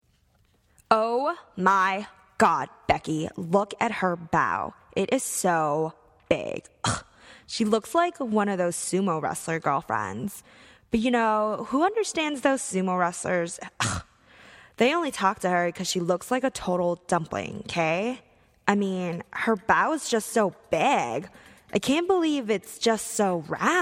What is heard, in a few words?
rap